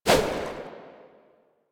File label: Water Balloon Fire; Warframe; Water Balloons